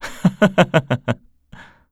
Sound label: laugh; Eternal Return; KOR